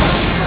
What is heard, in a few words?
close door